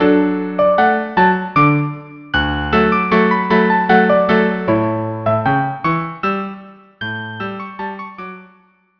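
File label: violin cello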